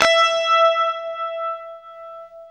UNIVIB E 4, Guitar; East West; Steve Stevens Guitar Samples Collection

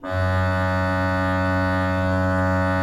HGRP F1, Cathedral Organ, HOLZGEDACKT; Propeller Island

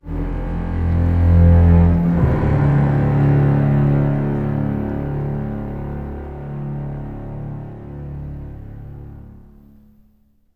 STR, orchestral, bass; Orchestral Loops

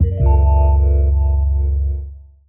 Misc Stab

